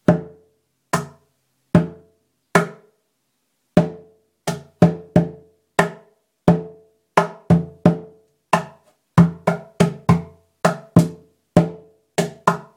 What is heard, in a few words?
cajon